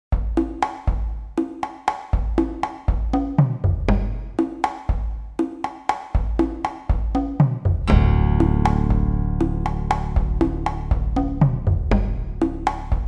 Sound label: World Beats